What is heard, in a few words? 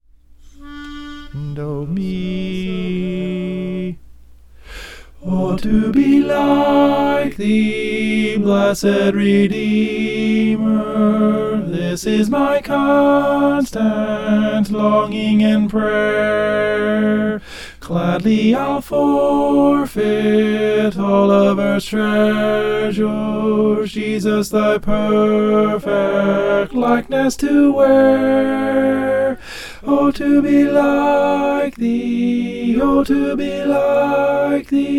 oh to be like thee tenor